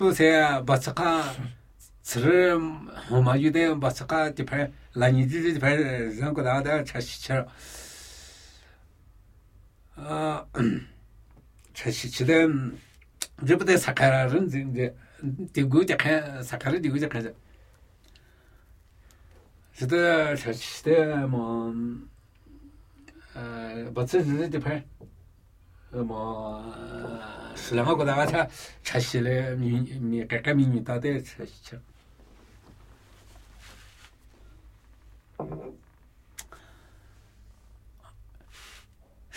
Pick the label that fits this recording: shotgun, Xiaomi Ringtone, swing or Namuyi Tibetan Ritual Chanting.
Namuyi Tibetan Ritual Chanting